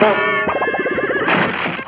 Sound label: bonk wobble thud